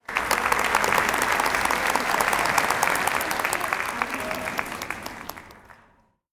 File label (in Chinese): 音效-掌声